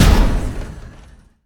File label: large hit; smite; chronos